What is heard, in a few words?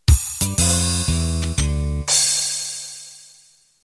Broadway Intro